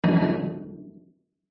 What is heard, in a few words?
impacts metal thud clunk heavy solid designed